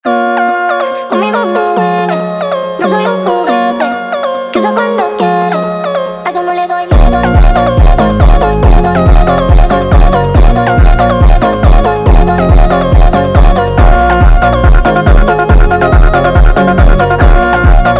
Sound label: Sped Up